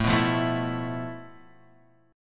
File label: F Major 7th Flat 5th A, guitar chord